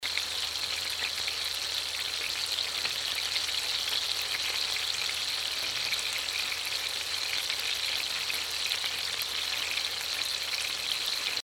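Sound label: sound of frying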